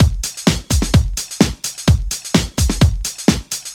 House Beat, 128 BPM

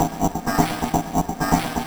VFX2 Loop 128 BPM

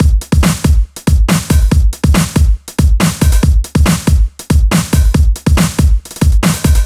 Loops BB 140, BreakBeats; Vengeance Samples

